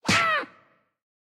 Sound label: hit; Bananaman - Chase in Space